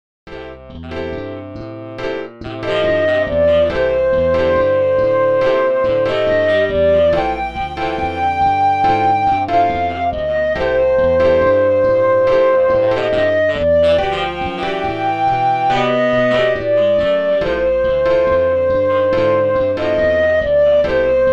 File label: MIDI blues